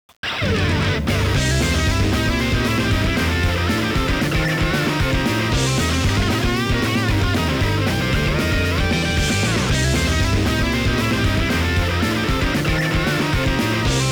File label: bgm battle; Yakuza Ryu ga Gotoku Kiwami 2